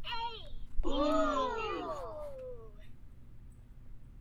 Hey Ooooh; BB Retail Therapy Sample Pack

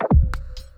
Chunky Kick, Drums; BB 2HTC Samples Vol 4

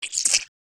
alien, creature

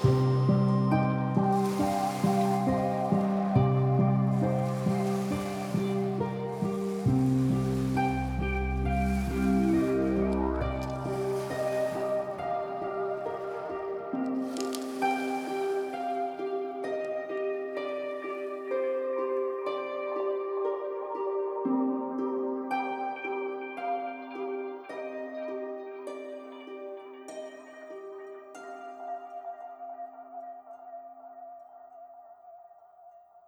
Piano Arp; BB 2HTC Samples Vol 4; Pads

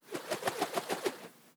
Twirl, organic wooshes; Micro Pack

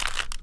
Small Cube Twist, Rubik's Cube

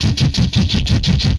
Helicopter Loop

